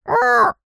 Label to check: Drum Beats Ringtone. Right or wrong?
Wrong